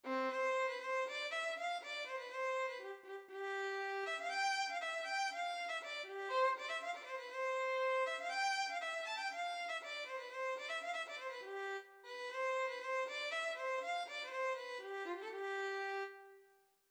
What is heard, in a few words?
violin